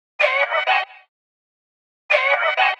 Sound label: 126 G# VOCAL LOOP, Funky Underground; Loopmasters; Funky Underground Vol 2